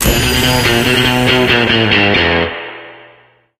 level four achieved

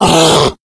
hulk pain; Left 4 Dead; Source Engine; tank

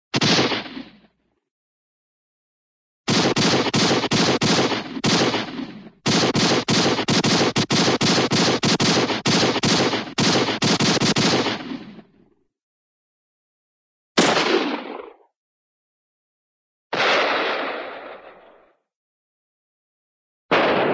gun, hand gun single gun shot; cartoon

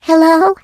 turret stuck in tube

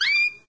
single bird; ambient; random animals; Left 4 Dead 2; Source Engine

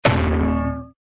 bronk; cartoon; hannah barbera cartoon sound fx

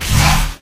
door round open

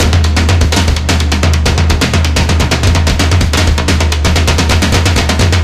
drums; Left 4 Dead 2; Source Engine; video games; zombat; horde

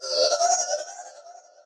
robot synth; Source Engine; Portal 2; robot parts

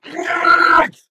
ballbot yikes

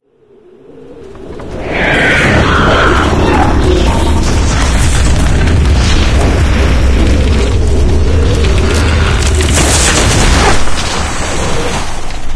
advisor through roof; video games; ambient; source engine; portal2; outland

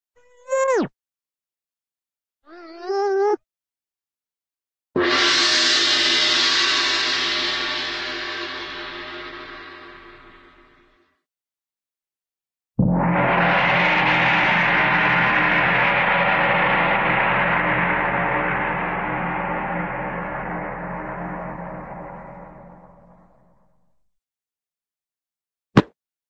kazoo, cartoon kazoo fade in

